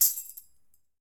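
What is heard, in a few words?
Tambourine C Shake; Latin Percussion; Drums; Drum Hits; Ableton; Factory Packs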